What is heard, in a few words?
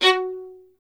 STR VLN, Solo Instruments; Miroslav Vitous